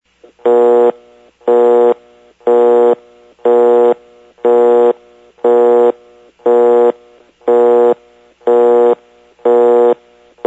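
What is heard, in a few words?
Busy, Western Electric Step by Step; sounds from preserved step by step switches; Phil McCarter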